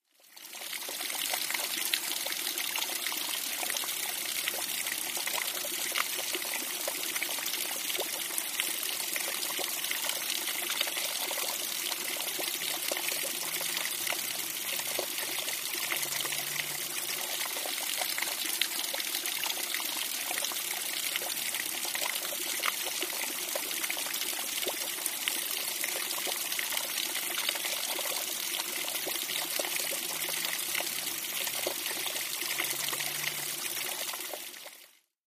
Water Brooklet Fall, streams

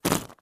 Staged Tire Blowouts

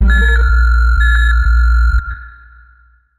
Computer Alarm Warnin, stinger

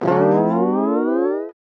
Cartoon Effect Guita

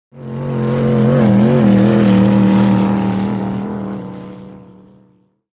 In Outboard Boat By, motorboats

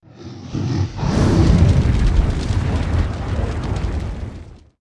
Dragon Roars Breaths

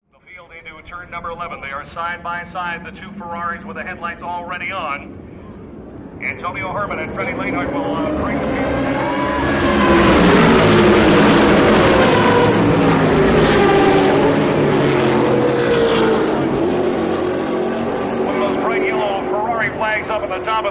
Pro Sports Car Race

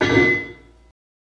Anvil Hit Clang

